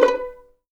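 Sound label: B4 pizzicato forte right, violins; East West; The Ultimate String Collection; VLNS PIZZ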